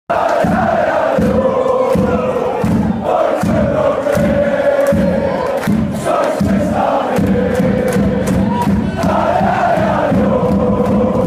allez allez allez oh, fan chants

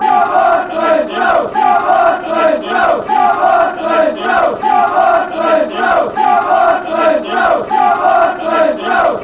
come, chants; thistle

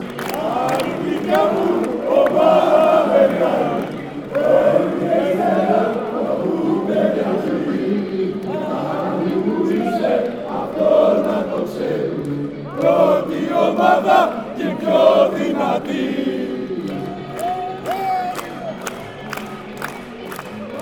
aris chant

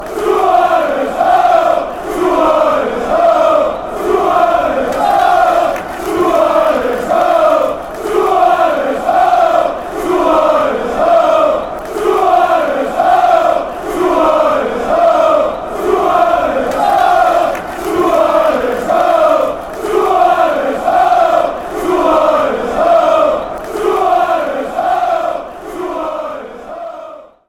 chants; suarez

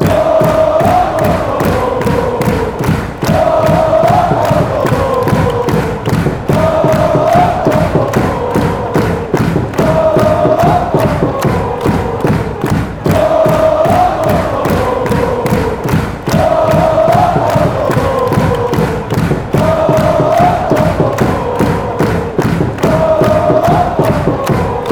ohhh, fanchants

